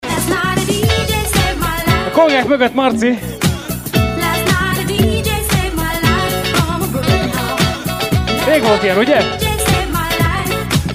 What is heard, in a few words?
dance; budapest